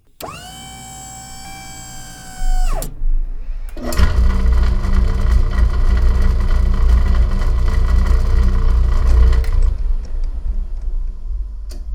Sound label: drill start